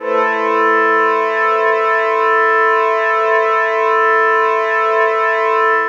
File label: Dream Pad (Min7th)